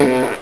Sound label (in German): furz